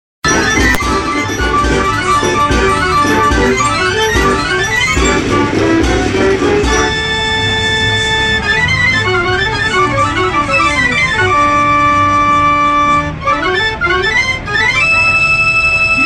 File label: steam organ at steam rally; welland steam rally